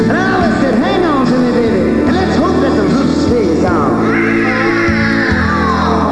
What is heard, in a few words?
roof